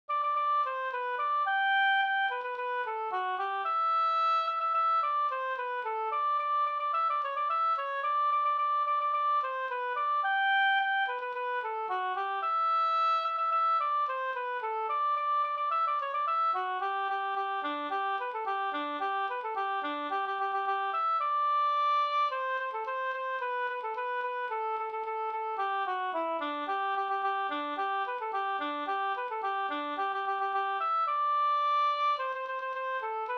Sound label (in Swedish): polka polkett; e CH Erlandsson Up